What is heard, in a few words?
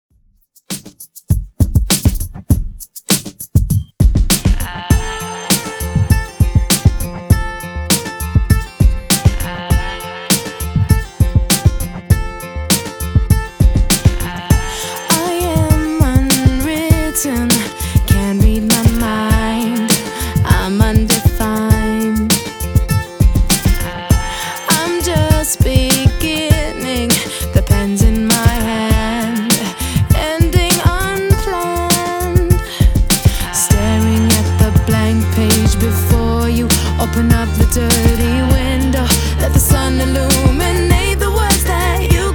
Rework Redrum 100 Bpm